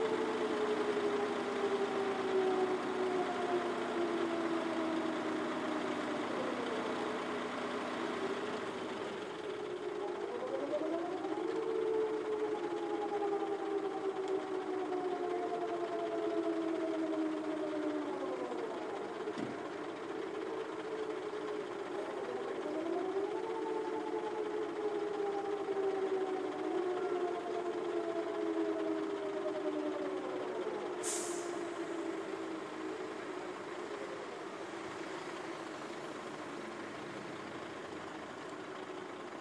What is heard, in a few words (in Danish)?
sirener forste onsdag i maj